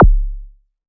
pipper; Kicks; single hits

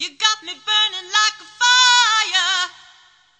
diva burn like fire, Cheesy Divas

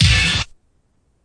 orch hit; Punches Hits Discoblasts

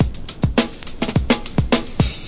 105 perfect roll, drum loops; 101 to 110 bpm

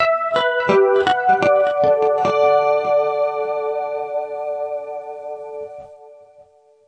clean guitar delayed harm; GUITARS

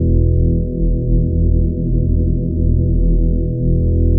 waver organ; Organs

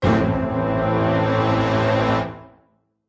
orchestra hit melody kit; PADS and STRINGS

